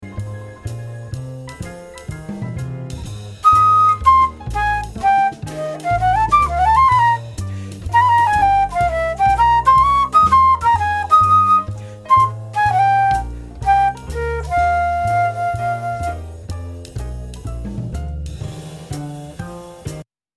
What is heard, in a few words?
Dim Chord